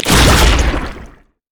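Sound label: passive death explosion; LoL; zac base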